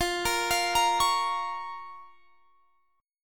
F Suspended 4th Sharp 5th F; Fsus4#5; arpeggiated; x,20,20,22,23,21

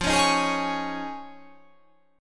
F Minor 7th Sharp 5th

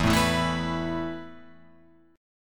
E Suspended 4th E, down, Guitar, Open F; Esus4 strummed

